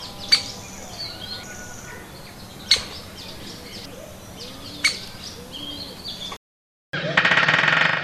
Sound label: bird audio